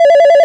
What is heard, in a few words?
Syn Dr, Overloaded Synthetic Drums; style kits